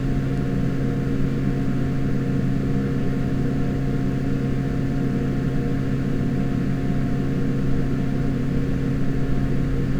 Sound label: Max RPM